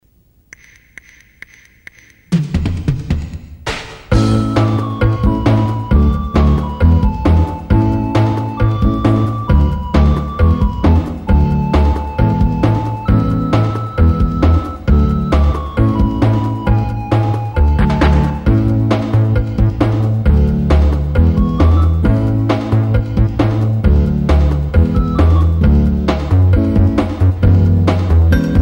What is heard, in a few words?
super karaoke